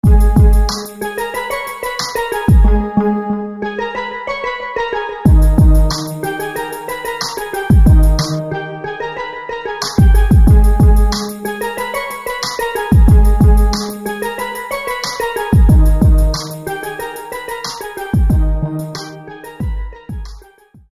Steel Drum; SFB FX

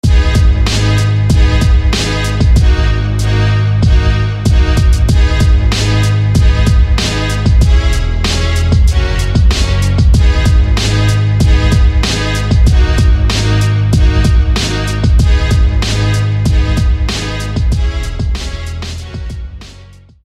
Diamond Strings

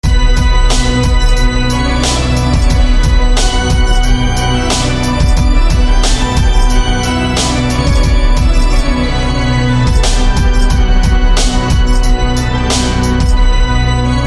Vampiric Organ

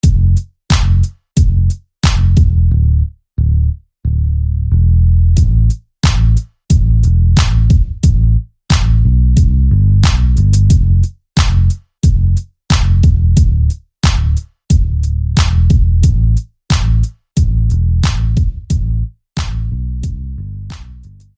Bass; Galant; SFB FX

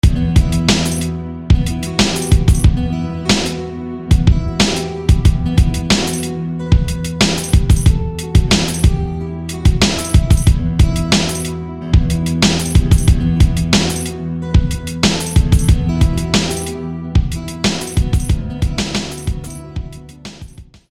Guitar; Stargasm; SFB FX

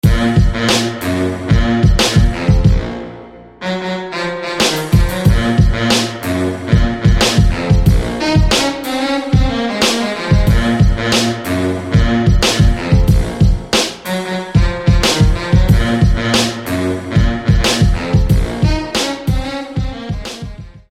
Tuba Sax Hit

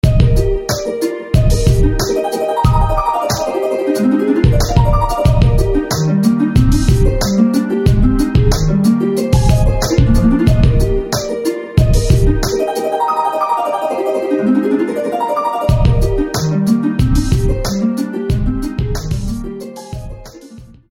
Flame Harp

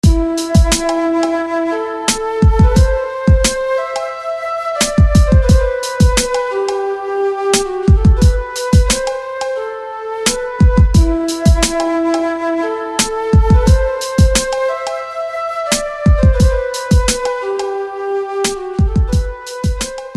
Flute of Oceans

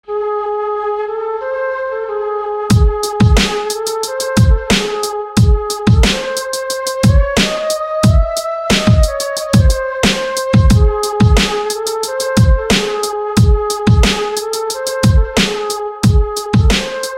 Element Flute

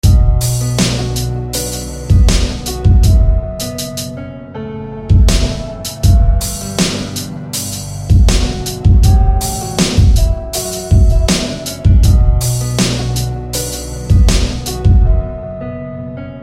Piano; Tailwind; SFB FX

